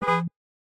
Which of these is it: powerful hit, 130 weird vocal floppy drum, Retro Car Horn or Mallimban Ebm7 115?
Retro Car Horn